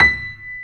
PIANO B5; AKAI S-Series CD-ROM Sound Library VOL-2

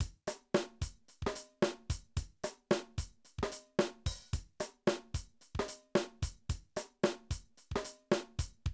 Drum loops, 70s funk

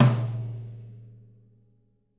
SNRONF L, Living Drums

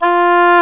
Oboe, Orchestral Winds

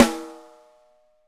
5X13, Drums; Big Fish Audio; Ross Garfield Drums VOL-2

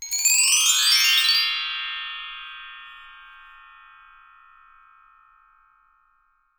BRASS M, TREE BELLS; Voice Crystal - Steve Reid Definitive Percussion

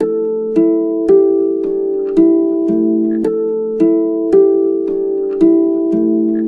A 110, Complete Harps; USB Soundscan vol.62; AKAI

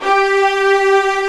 STRING SECTION G3, Strings; STRING SECTION #1

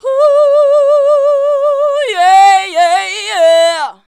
"huuyeayeah", shouts; Best Service; Hyper Dance

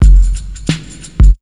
DRMS, Pure Electro; USB Soundscan vol.03; AKAI

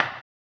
HAMBONE, Vocal Blues Soul; Spectrasonics; Planet

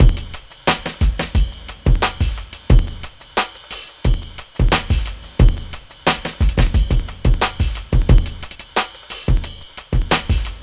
Drums, Hip Hop

